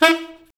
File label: SAX A. STAB, Alto Section; Roland L-CD702